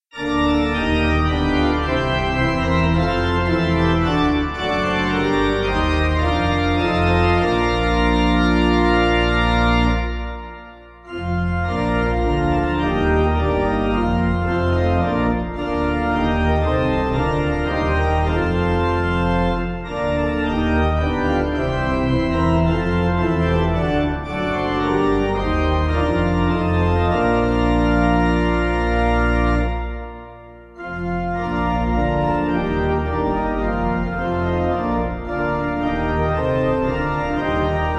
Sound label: Pipe